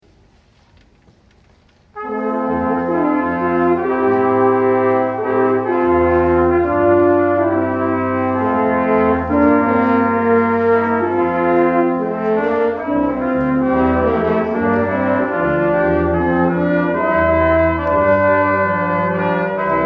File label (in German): Funff stimmigte blasende Music; Orchestra